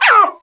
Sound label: seal dead; AntiTD